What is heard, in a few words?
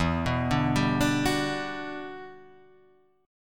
E Minor 9th, down